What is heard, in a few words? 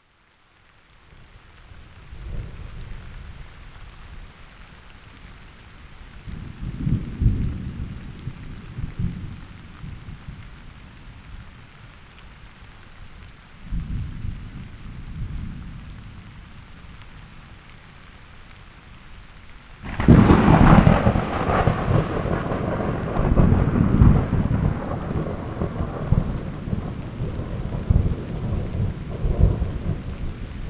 Cave Thunderstorm Rain; Mammoth Cave; Rain Moods